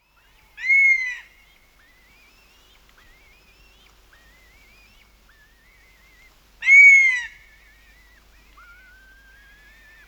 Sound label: Honey Buzzard